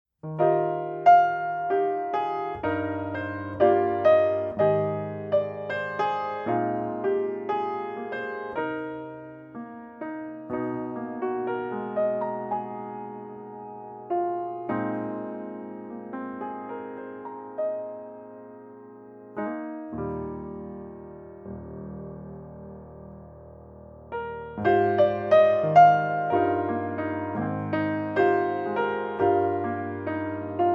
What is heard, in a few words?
Improvisation (solo piano)